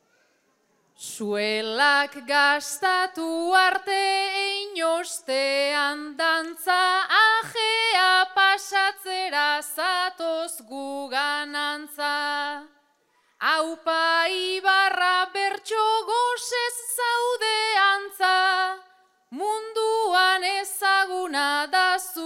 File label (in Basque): agurra, bertsoa; ibarra